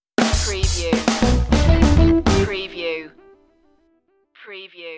funk side stinger

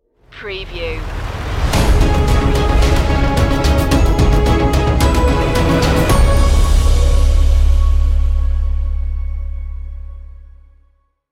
black banner stinger

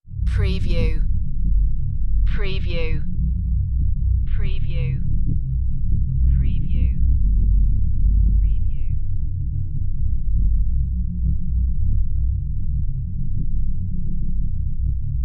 Movie Sub

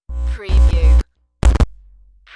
Static Noise

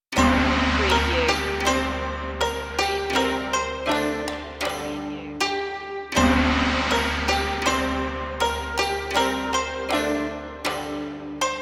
asian ceremony

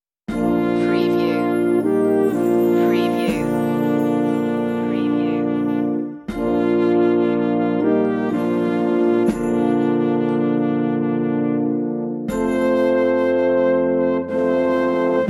silent night brass 60bpm

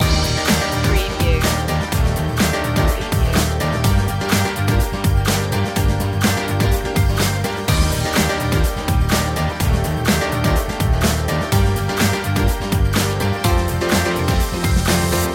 summer drops loop

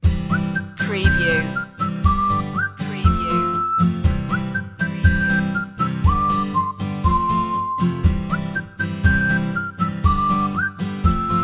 whistle happy

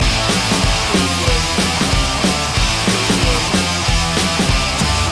fuzzbomb loop

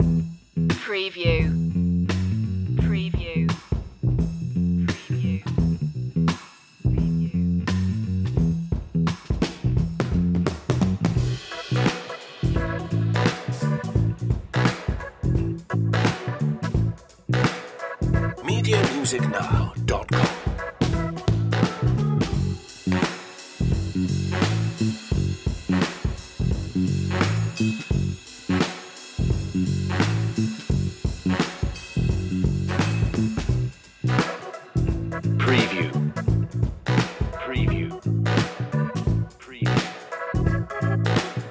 cashmere deluxe loop

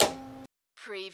Servo Panel Sound - Release

